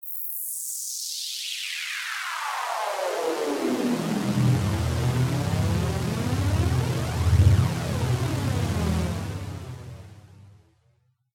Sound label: Synthesizer Sweep, Electronic Filter sweep from open to closed.; Sweepers; Sound Design